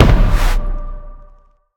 hit; smite; god scylla

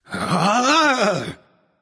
fear; smite; hou yi

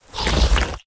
sand; smite; Anhur

